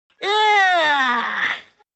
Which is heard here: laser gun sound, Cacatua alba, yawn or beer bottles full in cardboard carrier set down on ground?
yawn